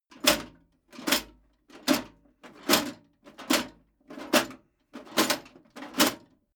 metal plate armor movement sequence

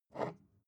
glass bottle pick up soft

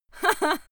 female laugh haha short

